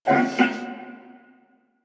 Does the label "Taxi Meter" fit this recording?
No